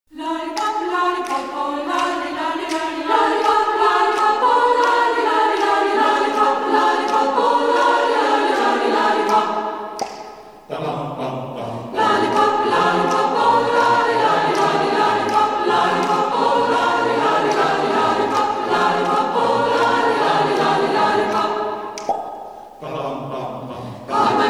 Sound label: Tutti